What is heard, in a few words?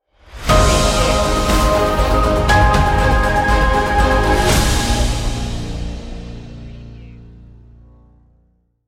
villain stinger